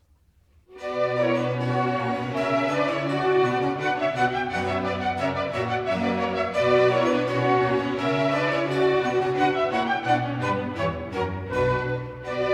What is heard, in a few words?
Orchestra Suite No2 BWV1067; Bach